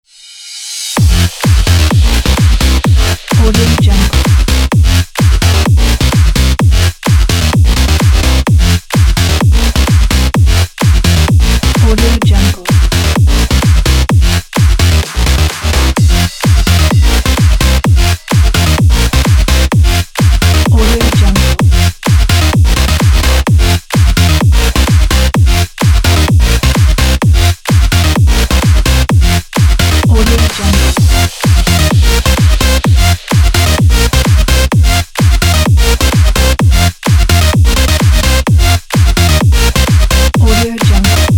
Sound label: dancing bass